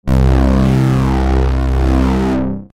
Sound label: juno bass; wap-terminal; ring